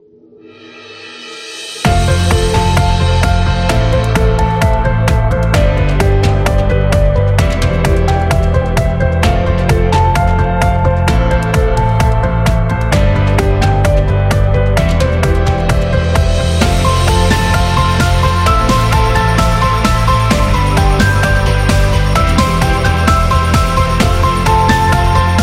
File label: Motivation